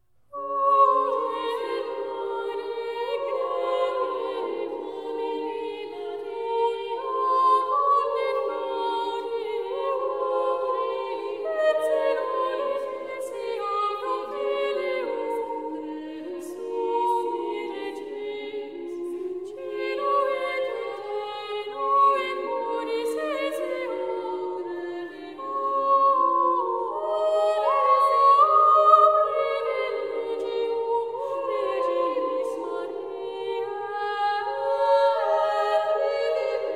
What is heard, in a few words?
Motet; Puellare gremium Purissima mater